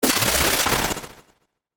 Stutter Flutter, Production, Element, Stutter, Flutter; BlastWave